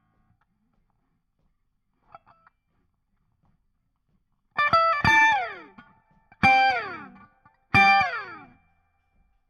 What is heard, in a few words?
Guit Solo Back